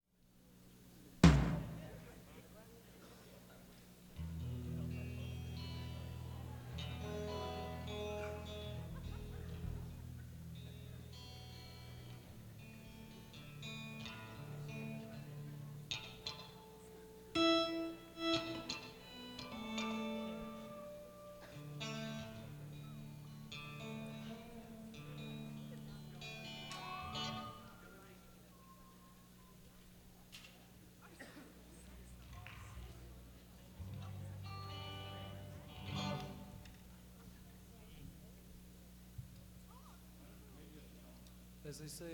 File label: Tuning